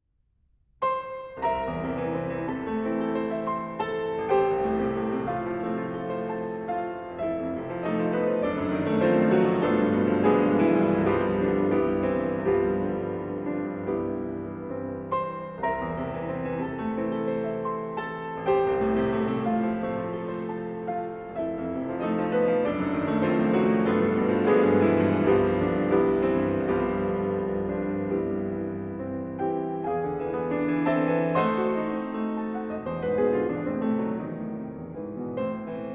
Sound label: Piano Pieces, Intermezzo in A Minor (Allegro Non Assai Ma Molto Appassio; Six Piano Pieces, Op. 118